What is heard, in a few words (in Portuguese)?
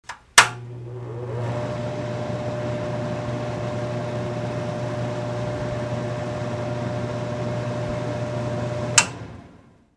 ventilador; Sons domésticos; pacdv